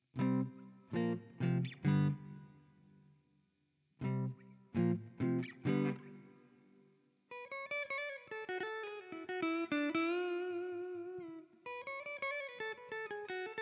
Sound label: Plate Small